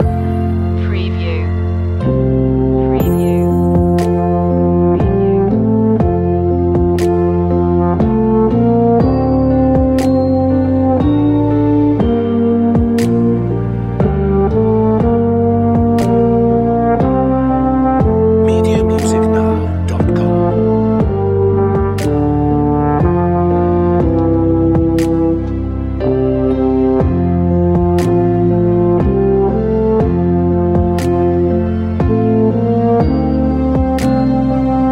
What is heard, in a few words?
away in a manger euphonium feature; christmas; traditional